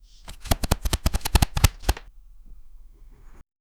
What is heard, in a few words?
an card deck shuffle